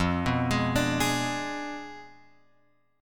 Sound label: F Diminished 7th; Fdim7 Chord {1 2 X 1 3 4} arpeggiated